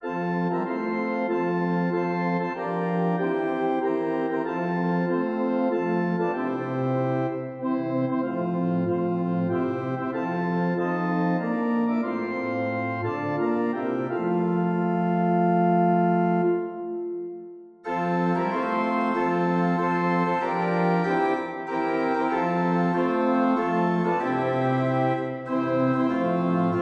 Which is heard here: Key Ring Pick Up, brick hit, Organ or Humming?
Organ